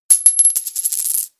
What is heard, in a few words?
Coin Msg Tone